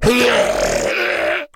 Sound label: Creatures Fight Death; Mighty Party; Android